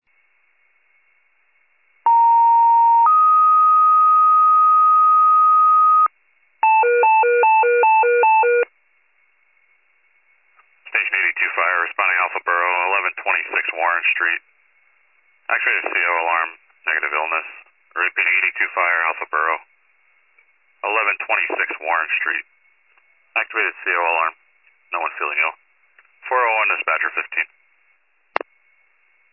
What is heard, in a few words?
fire, pager alert